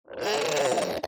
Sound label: retreat, strider; mob; minecraft